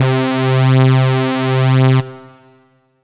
Yamaha CS2x Sync Lead C4

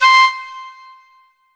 Korg M3R Soft Flute C6

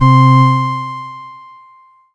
Yamaha PSR-22 Pipe Organ C3

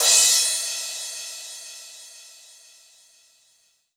Power Crash Cymbal; Korg NS5R

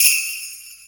Sleigh Bells

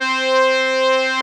Roland JX-03 Accordion C4

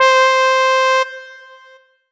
Yamaha EX5 Solo Trumpet C5

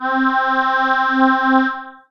Kawai K3 Vox C4

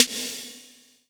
Kawai K11 Snare

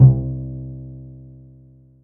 Yamaha TG 77 Acoustic Bass C4

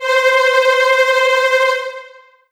Roland SC-88 Tremolo Strings C5

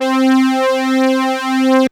Roland JX-03 Synth Brass 1 C4

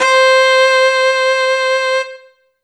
Kawai K5000W Fiddle C5

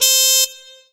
Korg TR Rack Mute Trumpet C5

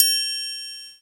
Roland R-8 Triangle

